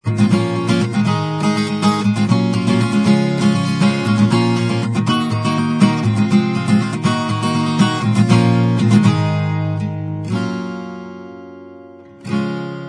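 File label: acoustic instrumental, backing track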